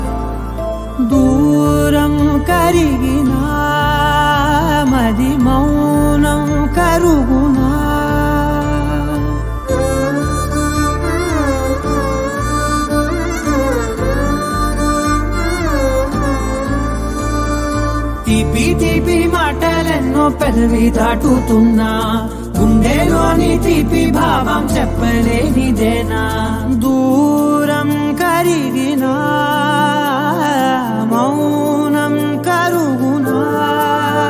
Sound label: BG Humming Ringtone